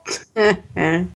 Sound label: chuckle